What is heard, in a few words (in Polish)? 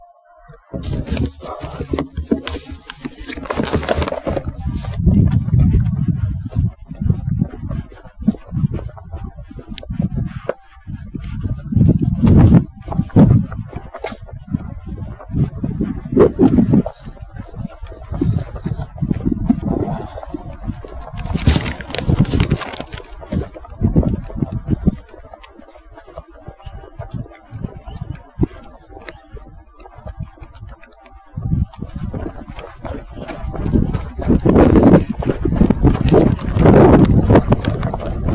ulica; ogrodowa37; po noise reduction; wavepad